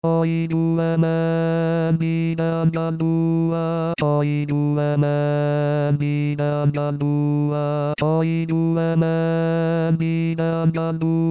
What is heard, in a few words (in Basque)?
oi gu hemen basse